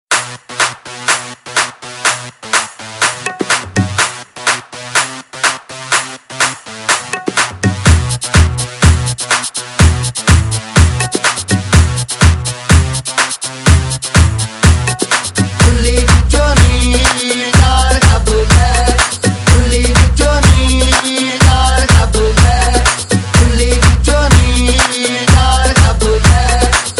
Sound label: The Groove